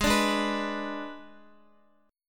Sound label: Ab Diminished Ab Guitar; Abdim strummed; JGuitar